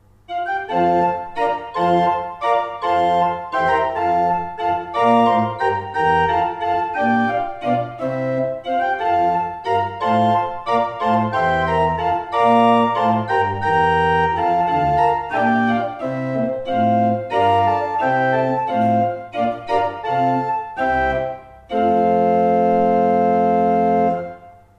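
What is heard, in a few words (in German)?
Vierdag 6 I, pfeifenorgeln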